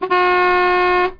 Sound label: Honk